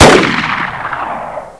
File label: turret fire; GoldSrc